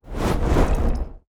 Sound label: Swipes Flags; Electa Quinney